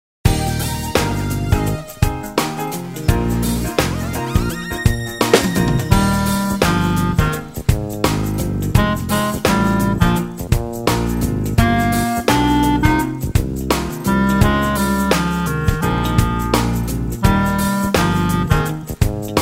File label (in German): klarinettentaxi